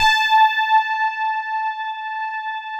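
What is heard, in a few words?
GLASS Rhodes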